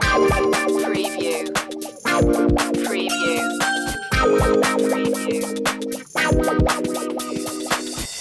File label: strange new funk loop; jazzy; jazz fusion